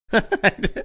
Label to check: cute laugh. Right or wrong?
Right